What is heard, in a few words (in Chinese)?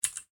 机械键盘 青轴